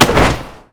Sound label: tumble; LoL; vayne